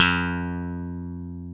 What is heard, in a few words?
F-0 SLAP, SLAP BASS; AKAI S-Series CD-ROM Sound Library VOL-7; SLAP BASS 1